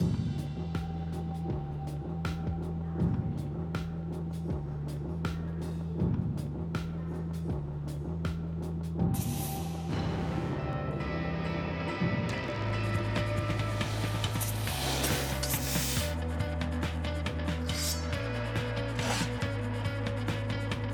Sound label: background guitar start then everything else; BlackHole C (into) ~ ActionSus C; mcsm music; Netflix